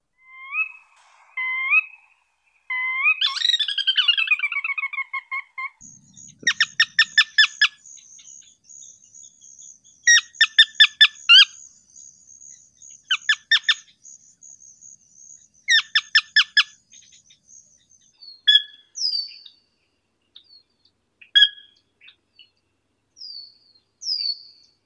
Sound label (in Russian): pogonish karolinskii porzana carolina; zvuki prirodi